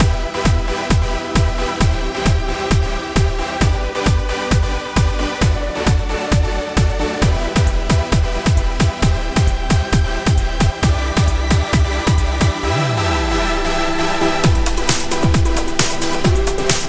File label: hydro house